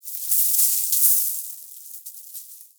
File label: Mountain Pass Snow Hit Player; Ambience; Warframe; The War Within